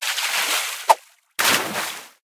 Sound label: swimming under the reels and jump trying to nudge misses; koi princess